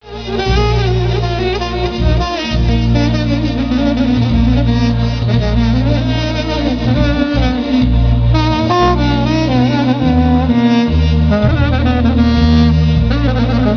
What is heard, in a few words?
sax